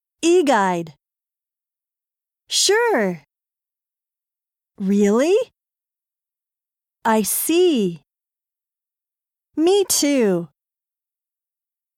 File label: harmony; 新課程英語教科書 指導用音声CD